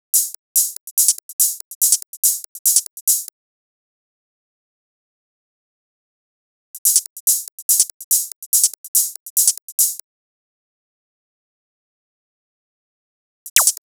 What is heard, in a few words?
Drum Loop - 143 BPM Hihats & Laser; Loop Stems; Cymatics; Hyperbass Drum Loop